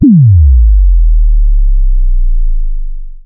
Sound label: Bass